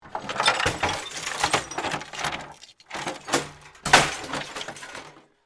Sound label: Crash Computer, Crash, Computer, Monitor, Debris, Movement; BlastWave